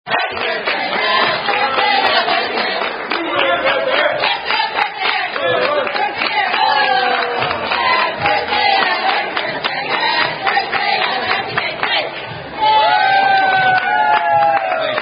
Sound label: Birthday [Tune Aluette]; Aunt B's